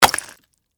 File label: Impact Ice Block, Hammer; Miscellaneous Impact (Sound Effects:Impacts)